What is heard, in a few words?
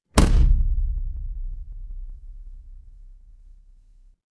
magic scifi shield